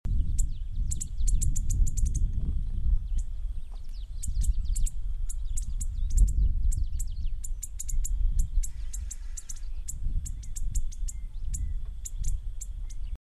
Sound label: Neothraupis fasciatus